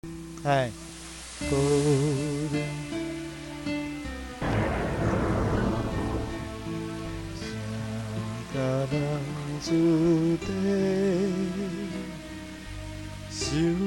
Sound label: sing